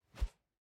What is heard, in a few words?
Fighting Woosh; SoundsCrate